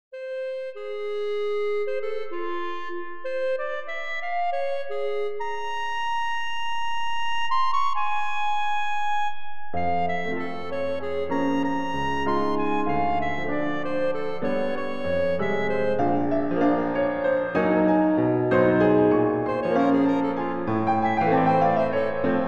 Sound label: Clarinet & Piano